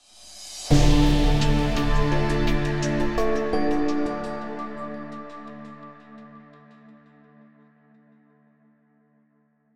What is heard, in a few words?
fanfare solving; Opus Magnum